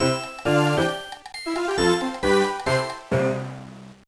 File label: Jingle; Chesty to Meigurumi-tachi no Mahou no Bouken; Kisekko Gurumi; GBA